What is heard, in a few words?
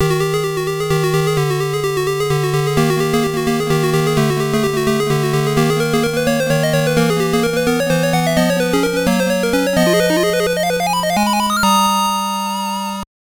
Jingle; Kenyuu Densetsu Yaiba; game gear